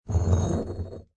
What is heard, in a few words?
Stone Drag On Cement; Dragging; Objects; Lynne Music